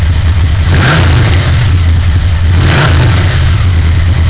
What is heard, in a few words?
exhaust